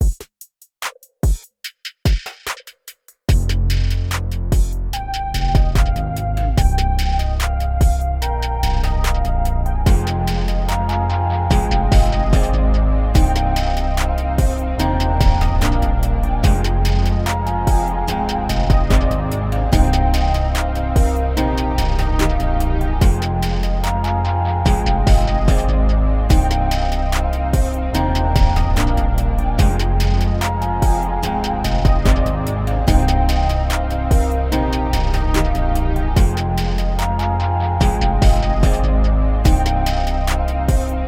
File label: 32 bars hip hop beat